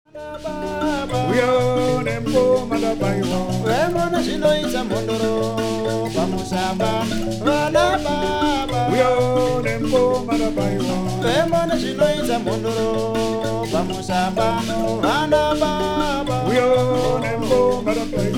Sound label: mbira